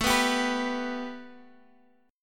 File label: A 7th Suspended 2nd A Guitar Half Step Down, chord